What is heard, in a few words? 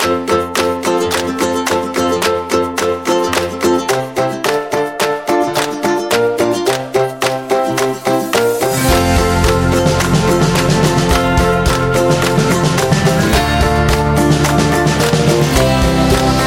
Upbeat and Optimistic, happy music